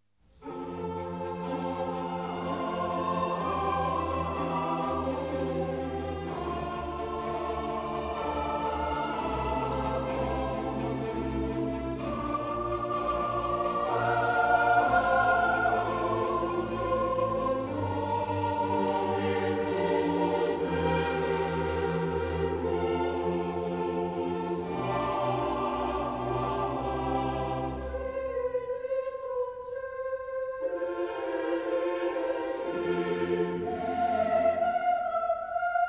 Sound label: Missa brevis in F Sanctus; Mozart; Missae and Requiem; Davis, Gardiner